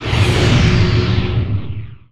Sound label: freezing